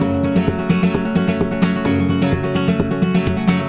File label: 130 F# Rumba; Spanish And Gypsy Traditions; Soundscan vol.59; AKAI